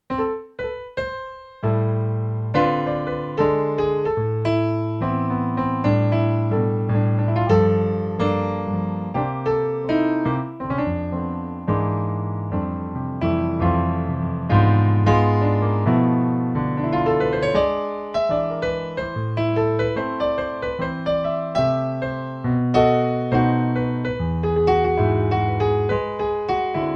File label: Swing